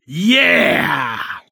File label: Bar Cheering When Somebody Orders Drinks